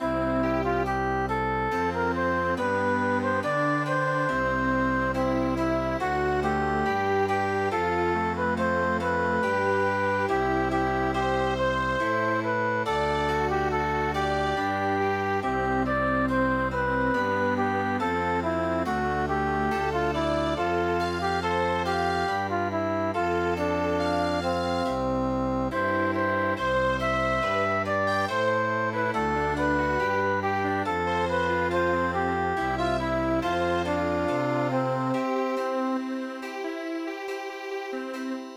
Chamber Music

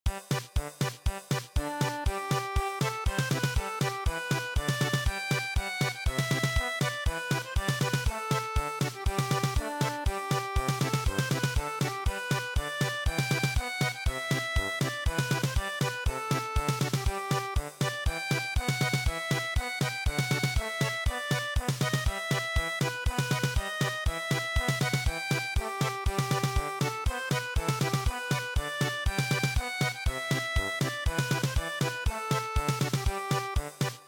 German Polka, traditional

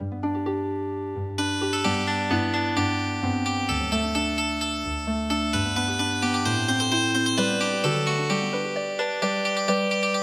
Healing Harp, traditional; midi

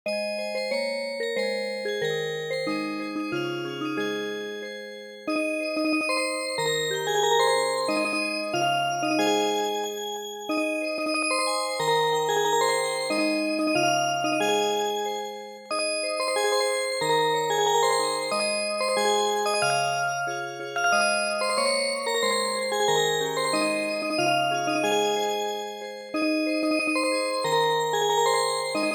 Music Box

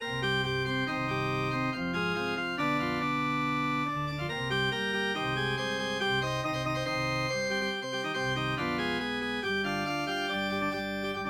Symphonie, organ

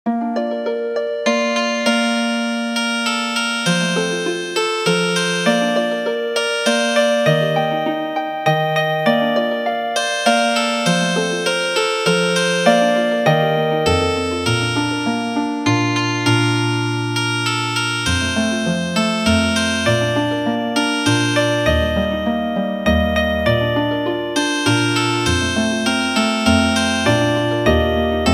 Healin Harp

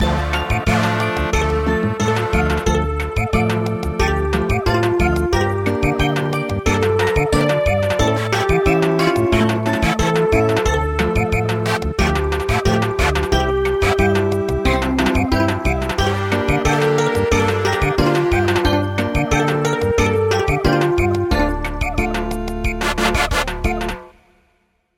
Samba, midi traditional; White rice with a moonlight

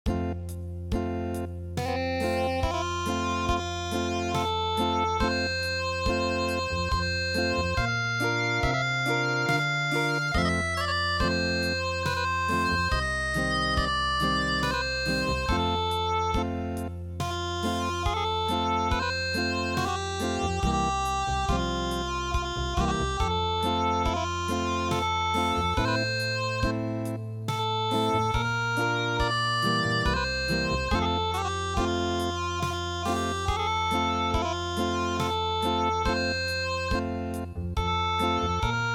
Unplugged

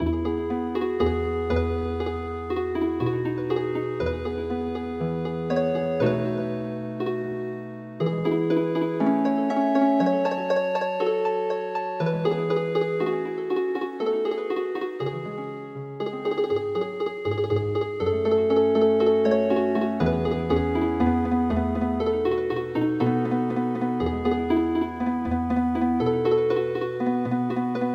choo choo train Healing Harp

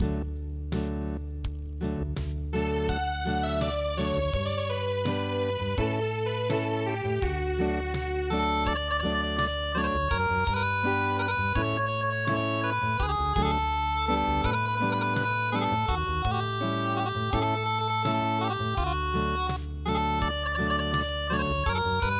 Unplugged, midi

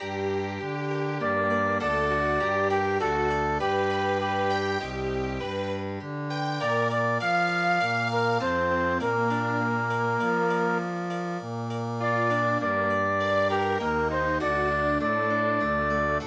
Chamber Music

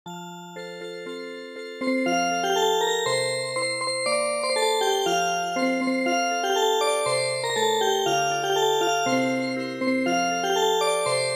Music Box; dwarf muku

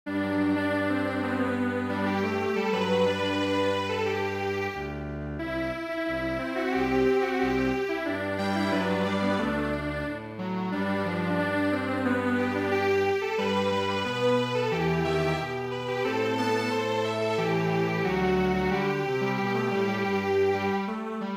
Hymn Strings Quartet; midi; traditional

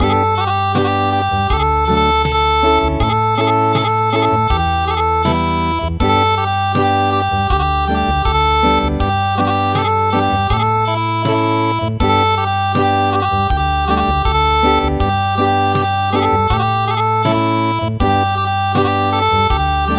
Acoustic

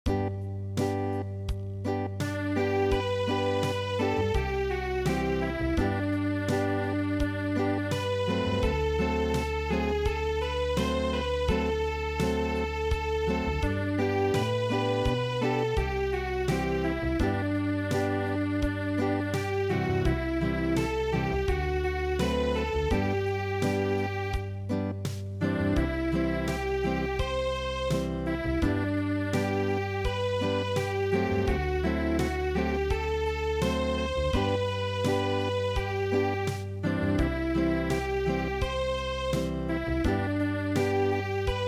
Acoustic

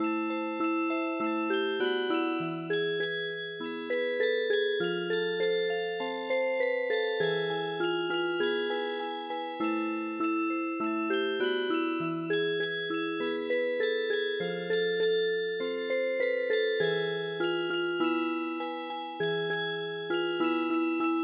Music Box, midi; Hava Nagilah; イスラエル民謡の「ハバァ・ナギラ」に癒し系のアレンジを施したオルゴール曲; 「ハバァ・ナギラ」は、ミディアムテンポな4拍子のイスラエルの伝統曲です; ＰＣ ＭＵＳＩＣＢＯＸ; オルゴール曲のMIDIファイル

